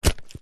footstep single shoe female boot leather buckle wet mud